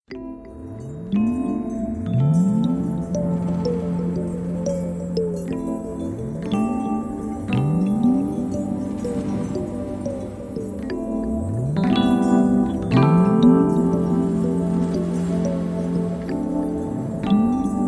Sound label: Underwater Meditation, alarms; Honor; X5